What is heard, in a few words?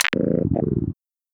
sniper empty; combine sniper; hl2 weapon